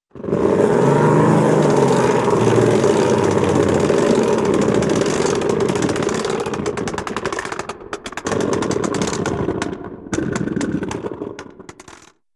Large Roaring Reverberant Motorcycle-type Engine, cartoon; Hollywood Edge; Cartoon Trax